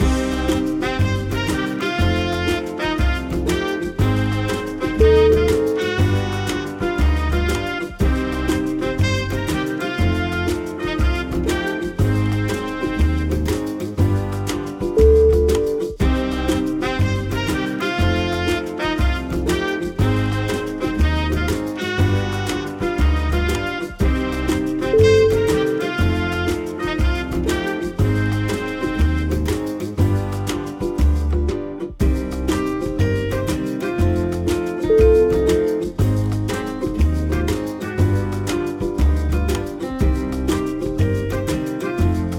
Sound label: jazz in cafe